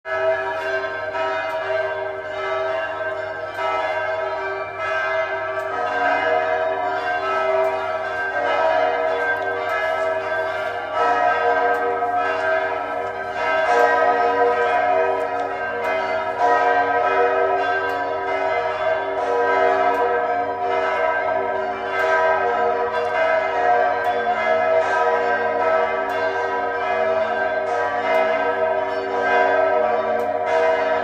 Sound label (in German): Glockenklang des Freiberger Doms